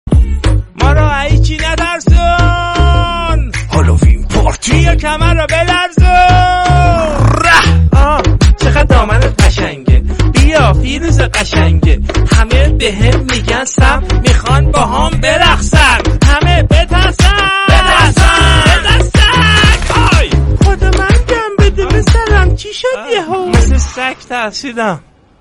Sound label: halloween theme song remix